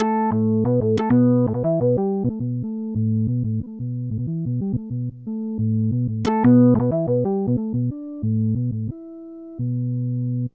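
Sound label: synth, A minor, Hip Hop & RnB; Saturday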